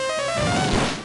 Spell Sizz; DQIV iOS SFX

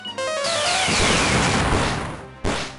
Spell, Boom; DQIV iOS SFX

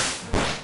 Attack, Silver Tarot Cards; DQIV iOS SFX

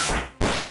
Attack, Liquid Metal Sword; DQIV iOS SFX

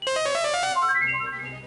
Spell - Fullheal; DQIV iOS SFX

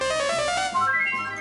SFX Spell; Midheal; DQIV iOS SFX

